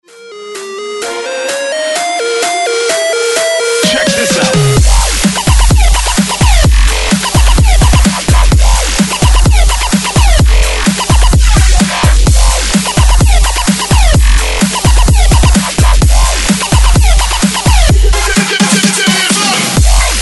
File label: 128 BREAKMIX